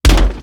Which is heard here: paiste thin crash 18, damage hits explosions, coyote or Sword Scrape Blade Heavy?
damage hits explosions